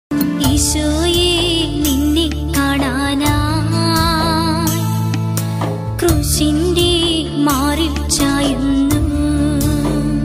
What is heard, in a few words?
malayalam christian ringtone